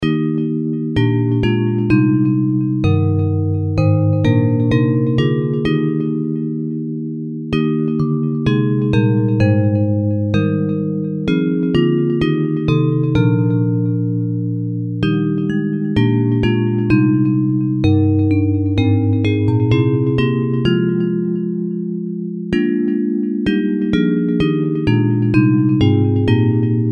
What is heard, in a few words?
Bells